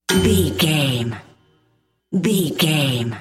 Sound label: sting, silly, circus, goofy, comical, mystery